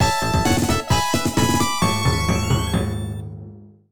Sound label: 1-Up; Sonic & Knuckles; sonic the hedgehog 3 angel island revisited pc android gamerip